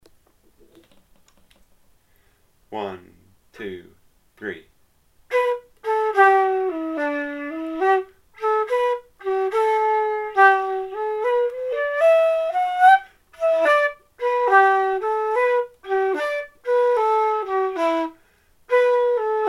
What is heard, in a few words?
slow